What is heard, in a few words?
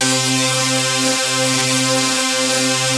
basses; Wizoo - Powered Wave